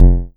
Vermona Kick

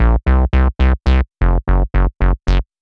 drumnbass 170bpm Bass C; musicradar; Basslines A

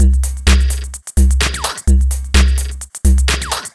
Beat 128BPM (Full No Kick), techno

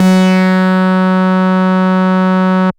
DnB Bass dist F#3, drumnbass 170bpm; musicradar; Bass A Multis

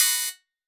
Tech Drum Hit, techno 120bpm

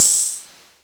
Jomox Hat

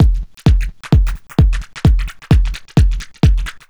Beat 130BPM (Perc Kick), techno; techno drum fx

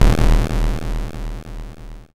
YM Bass E; ChipShop Deluxe; ChipShop Hits

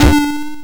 YM Synth D; SYNTHS; CHIPSHOP DELUXE; CHIPSHOP HITS

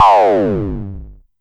Amdek Perc

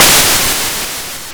YM Noise; NOISES; ChipShop Deluxe; ChipShop Hits

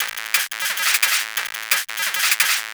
PO Perc, 175 BPM; loops; CHIPSHOP DELUXE

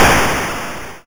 Snare, YM, CHIPSHOP HITS DRUMS; ChipShop Deluxe

